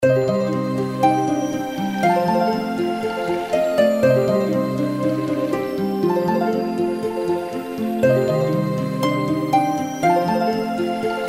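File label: fairy intro background music; Fairy Dust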